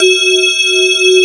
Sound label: METAL BAZZ